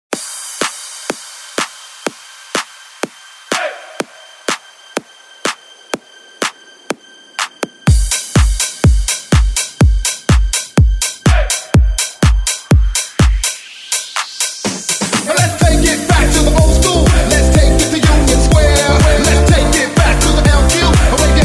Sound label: Remix Hype intro